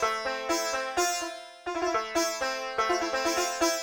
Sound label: ethno, 125, A